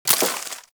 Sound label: Bullet Impact Debris Wood; Warframe; Weapons; Bullet Impacts